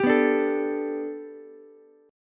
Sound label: B Minor 7th Sharp 5th B Ukulele, chord; Bm7#5; strummed; JGuitar